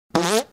Fart quick quiet ripple

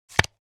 Playing cards poker blackjack dealing single card

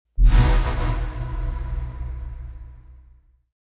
Ghost passing horror accent

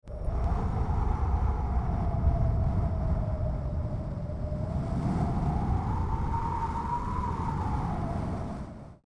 Alaska arctic wind blowing; Nature

